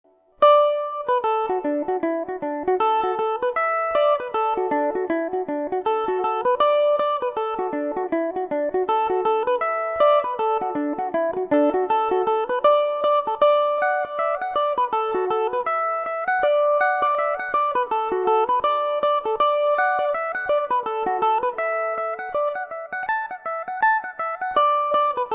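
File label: reel D major revelation, Irish mandolin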